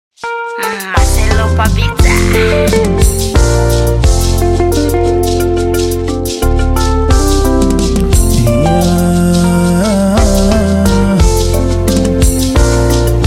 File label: Hymn